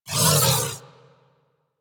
Crewship Turret Proj By, Corpus; Warframe; Rail Jack

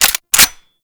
Lever Action Rifle Cock; Warframe; Tenno

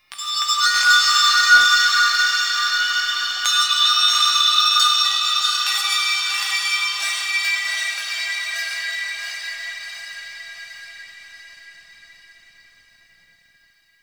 Metal Chimes; Warframe; Ambience; Nokko Colony

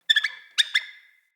Freed Hare Call; Encounters; Animal Rescue

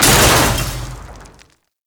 Loot Crate Open; Narmer; Warframe; Storage Container

